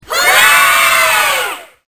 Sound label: kid cheer